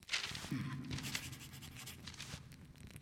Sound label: catch; accountant; fairymirror